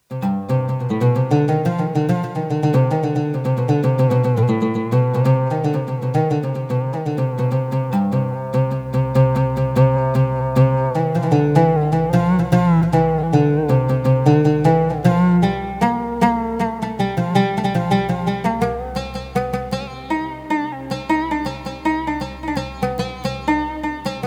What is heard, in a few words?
UD Nahawand C; Demo Instrumente